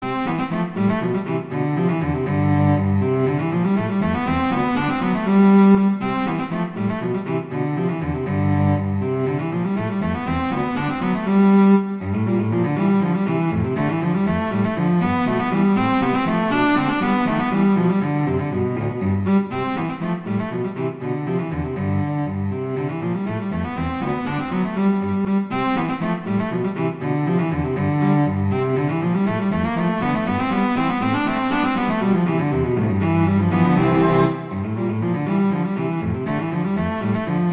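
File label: Etude, cello; Popper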